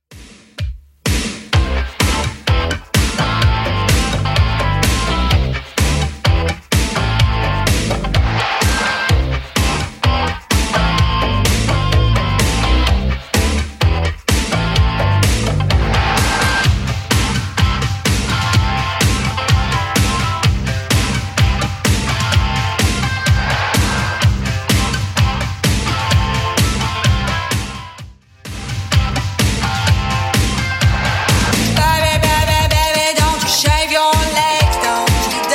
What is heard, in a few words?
80s Clubmix 127bpm